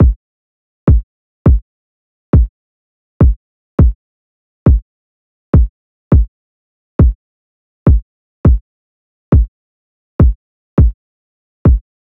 Drum Loop - 103 BPM Kick; Loop Stems; Cymatics; DOPE DRUMS VOL. 1; Street

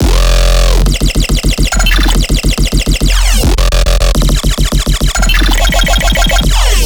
Riddim Bass Loop F 140; Overload; Ghosthack; Riddim Essentials Volume 2

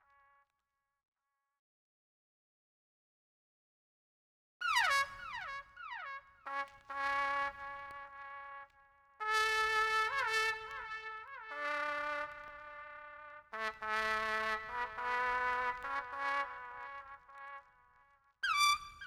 Trumpet, 104 BPM A Maj; Istanbul

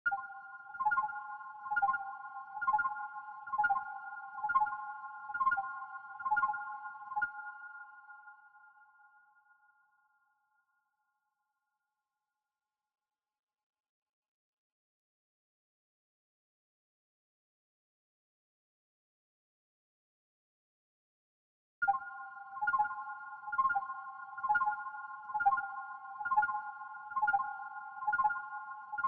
132 BPM F Min Synth Arp; Melody; Loop Stems; Cymatics; 2022 Melody Collection Platinum Expansion; Spacecraft

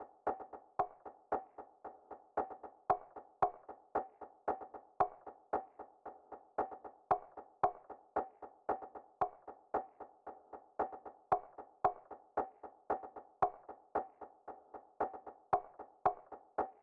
Drum Loop 114 BPM Percussion; Loop Stems; Cymatics; Vibes II Drum Loop Collection; Toast

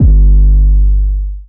808 G#; Cymatics; Oracle Sample Pack; 808s

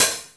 One Shot Hat, Deep House; Lover Groove; Ghosthack; Advent Calendar 2019

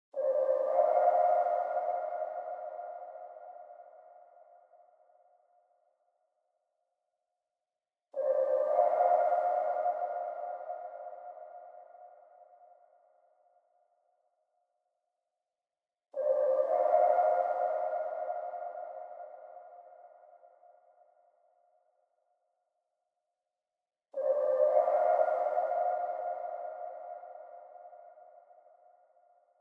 120 BPM G Min Lead; Melodies; Loop Stems; Cymatics; 2022 Melody Mystery Expansion; Unfamiliar

